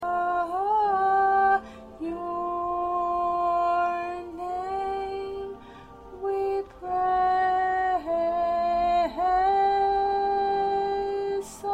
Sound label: 1st Alto, choir parts